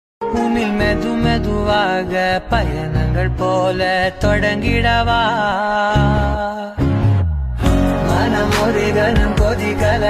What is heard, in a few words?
new south movie ringtone new tamil song